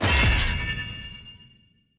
Short Sword Medium Parry Perfect Counter, Weapon Impacts; Player; Swords; Combat